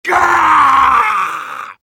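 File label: pain critical death; sniper; garrysmod; tf2-content-gmodcontent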